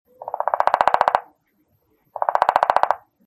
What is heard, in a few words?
downy woodpecker